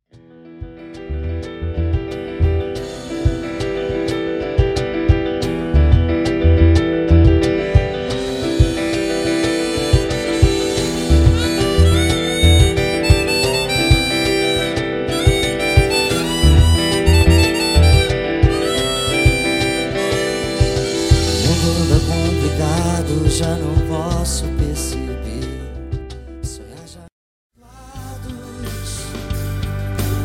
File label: harmonica solos